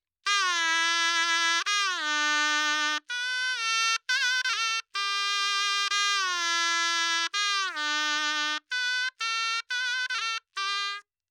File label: Shenai, Melody, 3-4, G, 128, Dry; Sample Banks